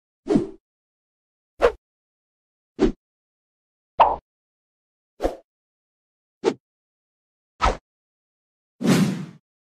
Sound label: swish swoosh cutscene sound effect